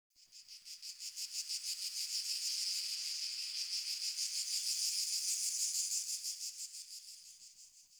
Insect Day; Warframe; Eidolon Plains; Eidolon Landscape; Ambience; Insects

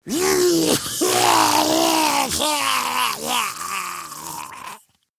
Attack Grineer Ghoul; Grineer Ghoul Expired; Battle Barks

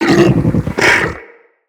Infested Juggernaut Vocal Idle; Vocals

